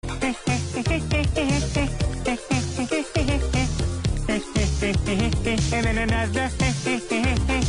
Heh Heh Heh Heh

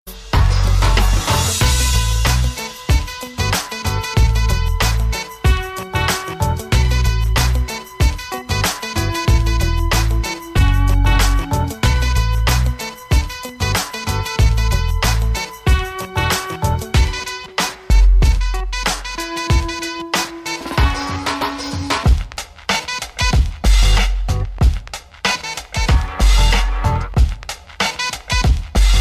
beat

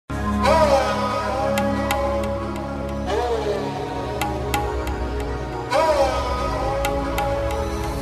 New Nokia Supernova

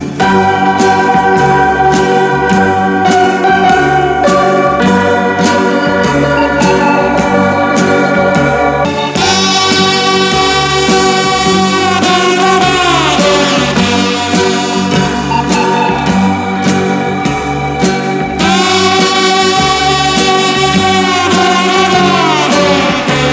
jazz classic

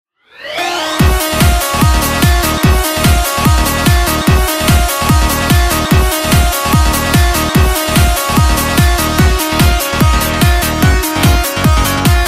Naagin Tune Mix

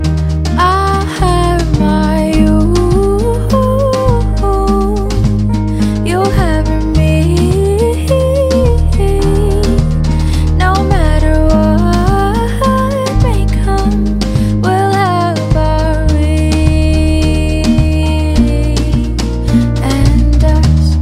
Chorus

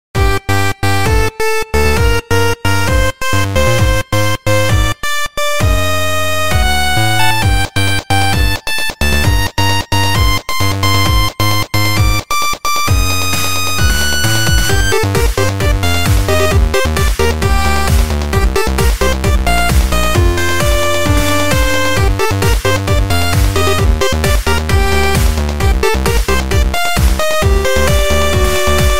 8-Bit; Nidhogg

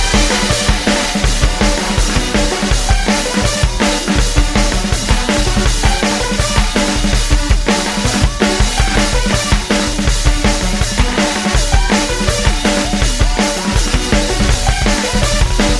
Drum Solo

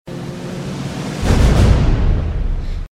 Sms Louder; Potc

